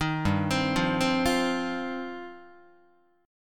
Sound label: G 7th Suspended 4th Sharp 5th, Guitar; G7sus4#5 arpeggiated